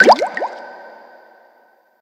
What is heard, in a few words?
Bubble Note, notifications; Fujitsu F-08D